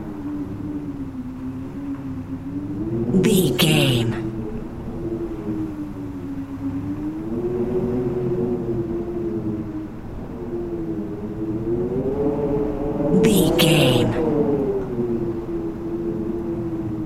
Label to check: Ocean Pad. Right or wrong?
Wrong